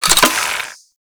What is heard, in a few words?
Secondary Mech, Weapons Cannon; Kuva Zarr